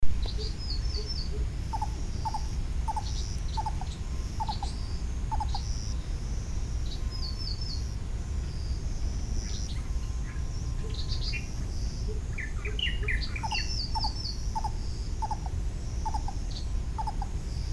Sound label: coucal lesser Centropus bengalensis